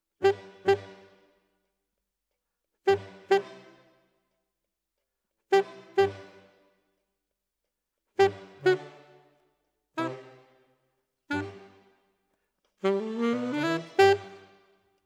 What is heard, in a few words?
Alto, RNR for piano